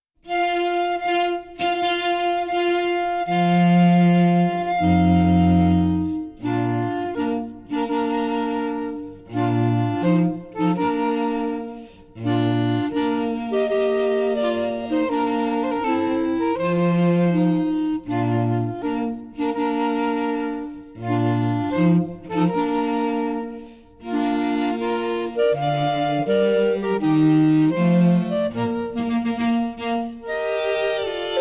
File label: bridal chorus